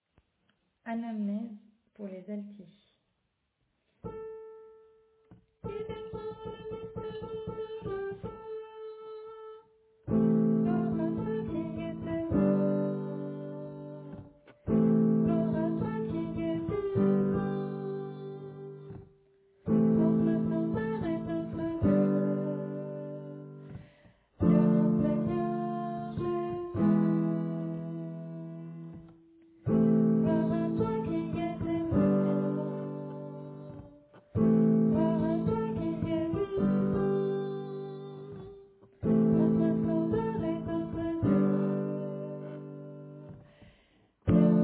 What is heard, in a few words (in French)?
Anamnese Alto